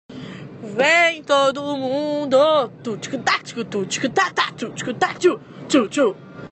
beat box

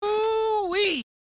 oooweee

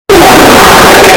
wet fard dankified

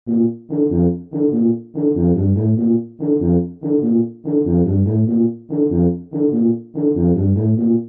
fat tuba

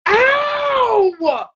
ouch

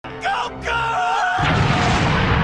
firework; krillin

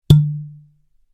cork popping

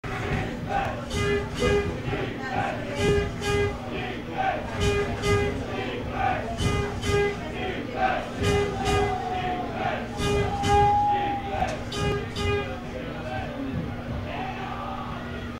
kalinka on the organ

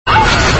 raven moan

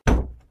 car door slams

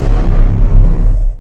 epic snare

